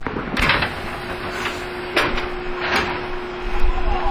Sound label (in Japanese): ドア開け, 221系 通常車; 収録は湖西線直通列車。; JR西日本; じわじわと数を減らし始めている。